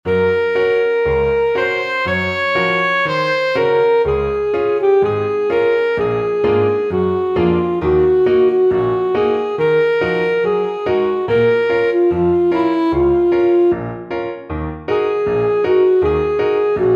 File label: saxophone